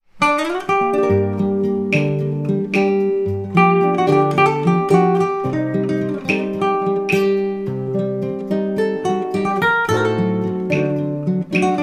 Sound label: Cubano Blues